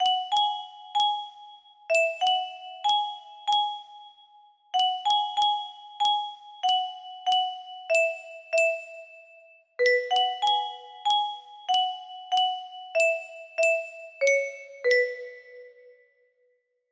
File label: music box cover